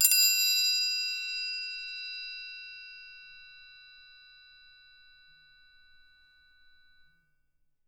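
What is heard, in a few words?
SM TRI left, percussion; Miroslav Vitous - Percussion & Harp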